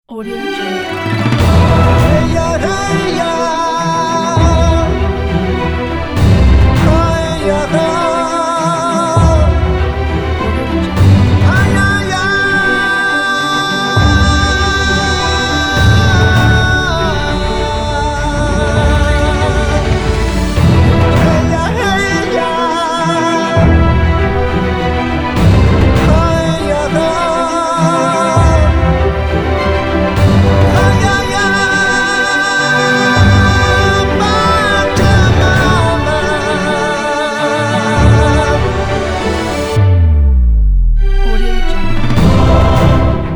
epic peruvian indians trailer

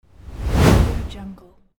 swoosh

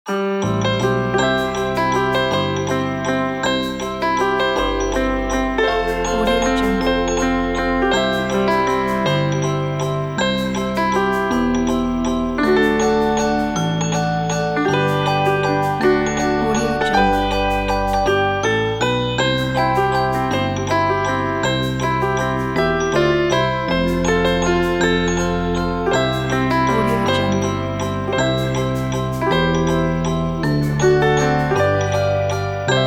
happy christmas holidays loop

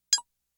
blip tone single high pitch synth blip staccato; prosoundfx

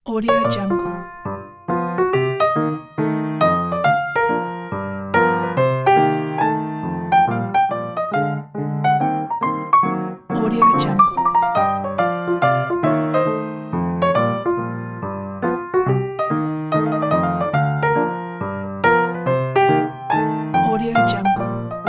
jazz piano changes

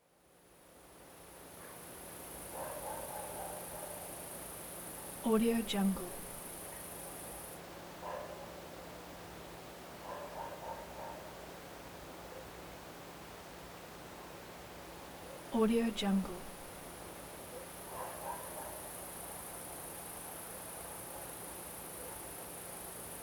small city night ambience

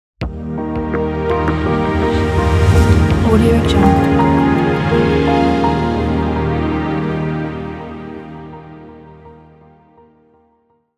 elegant opening logo

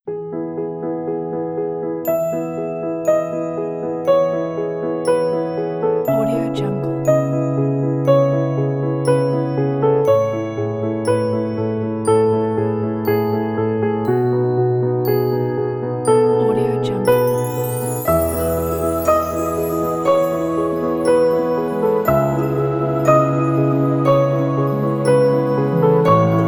winter piano; animapiano

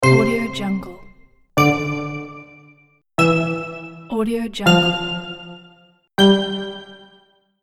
slot reel stop

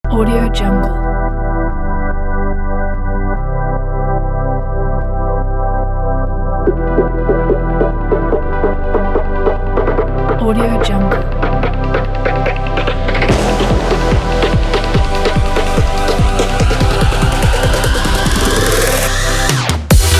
energetic future bass

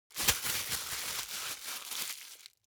plastic bag rumble quick; foley; smartsoundfx

